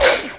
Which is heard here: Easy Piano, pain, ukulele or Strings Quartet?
pain